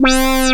syn c, Dance Synths, Acid Bubble; ProSamples vol.37; Best Service

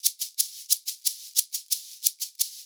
shak, percussion loops

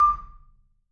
MMED F D5, Marimba; MRMBA SPLIT

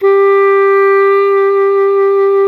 G 3, clarinettino; SoundBytes - Early Patches

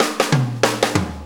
Shuffle Fill; Shuffles; Fills; Drum Tools

